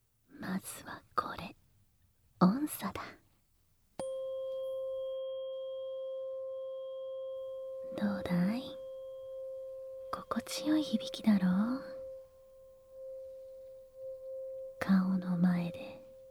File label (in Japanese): 癒やしのASMR